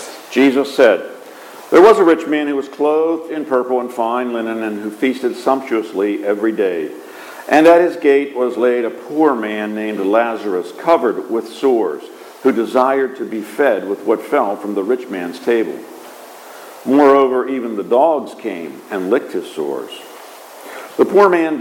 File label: Gospel